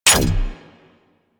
Rifle Firing Started Mech; Weapons; Warframe; Corpus; Crp Ruban Rifle

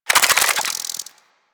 Infested Ashen Move Start; Warframe

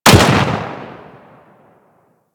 Pistol Shot 3D; Kohm

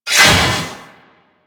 Proc Slash; Mods

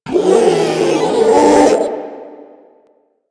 Strike Scream; Enemies; Abilities; Warframe; Proto Infested Ancient

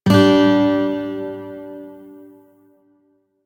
Shawzin Chromatic Chord E, Music; Warframe

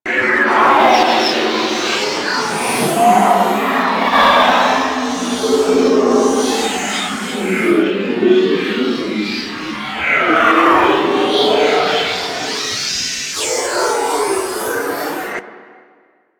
Wraith Void Fly Loop; Enemies; Warframe; Zariman

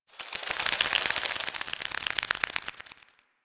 Forest Butterfly Flaps; Ambience; Warframe; Grineer Forest

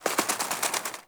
Exterior Forest Critter; Warframe; Ambience; Duviri

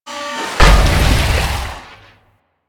Piston Fall Water Large; Warframe; Ambience; Eidolon Plains

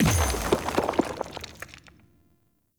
Turn To Stone Impact; Powers; Fey

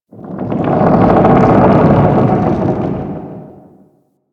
Settlement Low Rock Creak; Ambience; Warframe; Grineer Settlement

